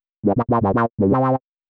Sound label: 120 synth licks C, Keys, Nu Jazz House; PowerFX